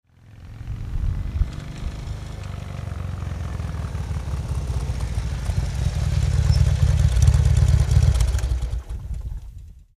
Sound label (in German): Motorrad langsam ankommend